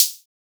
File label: hat, hithat